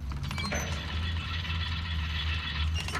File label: manager Catch; fairymirror